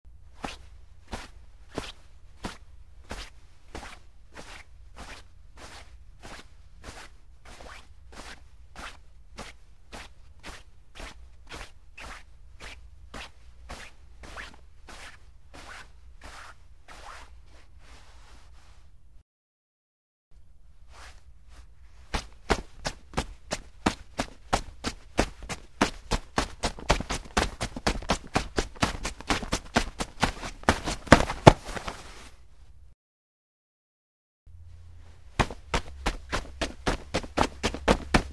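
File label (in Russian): шуршание джинс при беге движении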